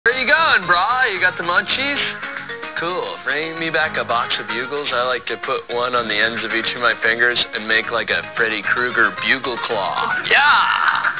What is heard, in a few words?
bugle